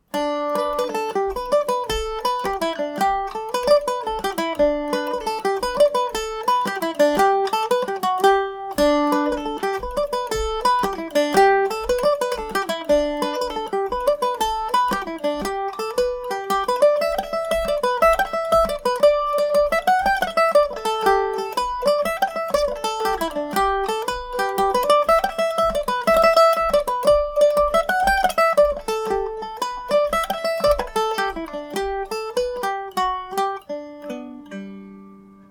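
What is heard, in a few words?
G major md304, Irish mandolin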